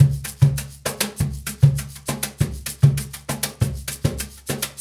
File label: Cajun, Percussion, Drums; Essential Percussion